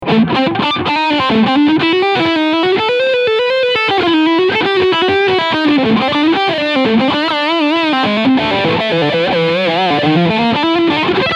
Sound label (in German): E Gitarre Leadsound Steg Pickup; Dean Guitars Exile Select